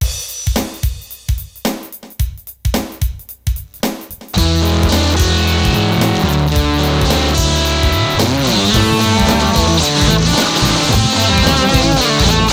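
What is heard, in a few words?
fuzz factory; fuzzfuctory; effecter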